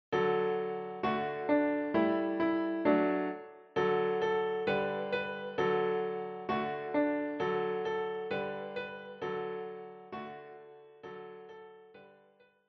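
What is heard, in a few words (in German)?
Backe backe Kuchen Klavier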